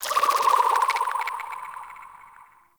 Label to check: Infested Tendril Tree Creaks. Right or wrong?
Right